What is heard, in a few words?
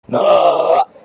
jumpscare sound; fnakazgrin 3 0 4